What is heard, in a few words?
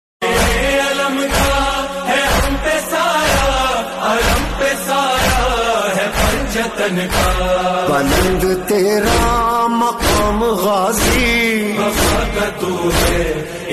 Noha Ringtone